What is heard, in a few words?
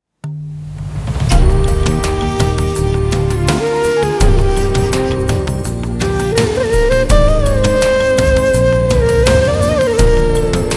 theme flute; amritavarsham 72